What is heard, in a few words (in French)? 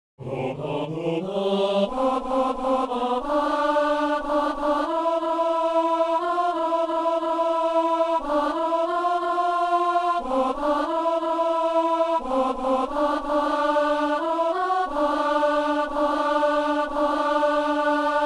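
ténor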